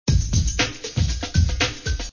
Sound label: title Sound; jumping rope